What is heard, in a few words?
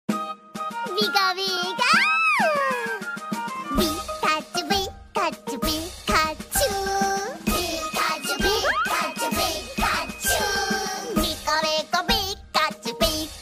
Ringtone; Pikachu